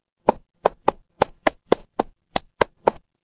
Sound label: footsteps concrete